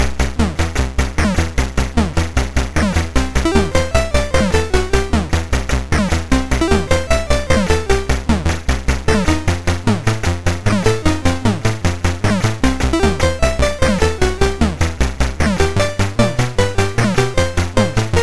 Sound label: MOS6581R3